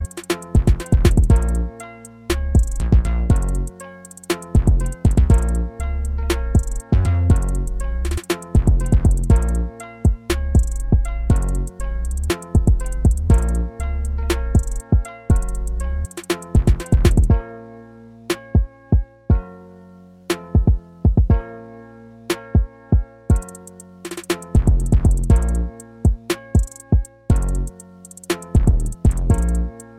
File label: Trap Beats